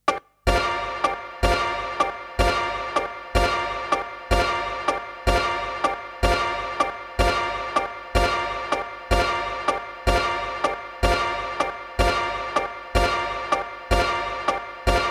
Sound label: SHORT STABS MUSIC LOOP GM (125 BPM)